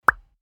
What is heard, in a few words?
Pop